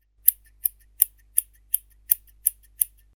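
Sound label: scissors sound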